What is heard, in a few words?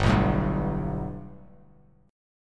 G Major Add 9th, Bass Standard; strummed